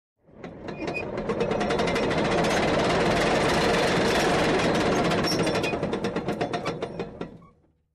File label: Metal Gear Chains rolling